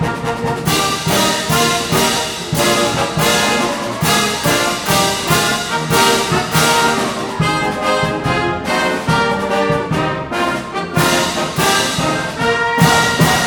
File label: fight song ringtone; uwf